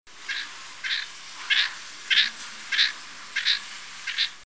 frog

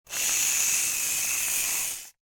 hair mousse

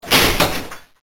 jail door close

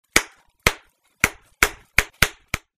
bubblewrap

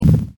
BLAST

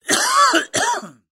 cough

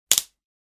wood cracking

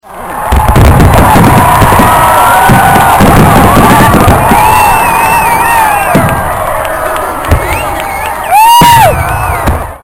Fireworks

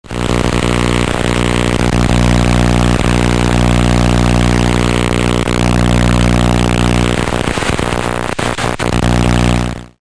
radio interference

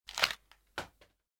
paper throw

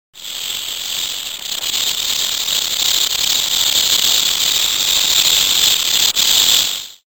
rattlesnake

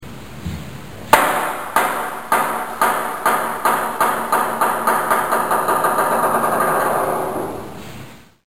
Bounce

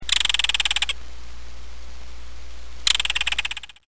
Belted Kingfisher

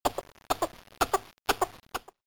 CRY

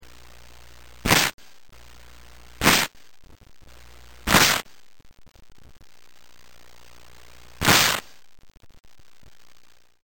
Rips

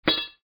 metal hi

